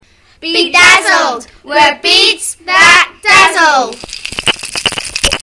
jingle

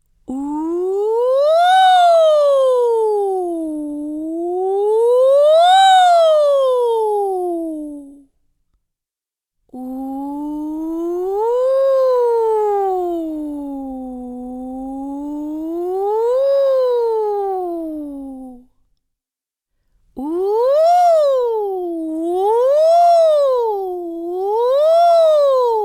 Siren

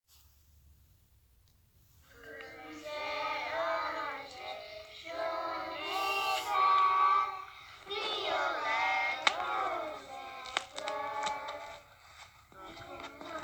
singing in French

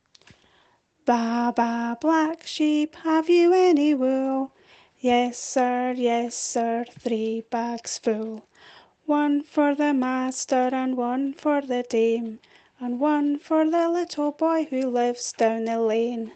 Baa Baa Black Sheep

